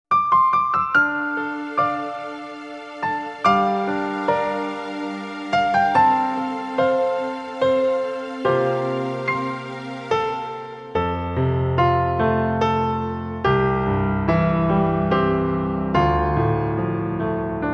Pianos; Blest Be The Tie That Binds

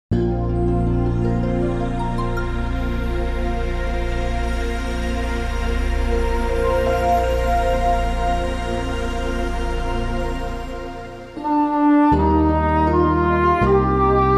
Clarinetes I When Morning Gilds The Skies, Instrumentales

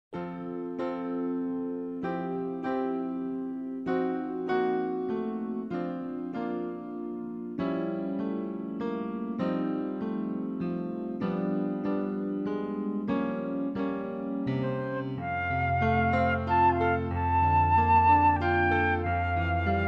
Flautas; A Graça de Deus

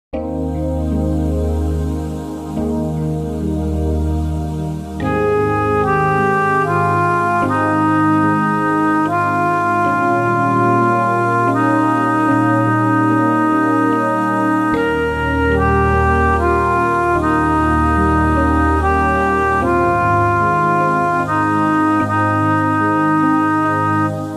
Clarinetes II; Open My Eyes That I May See

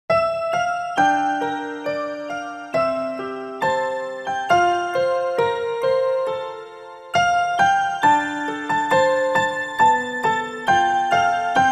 Organos, Instrumentales

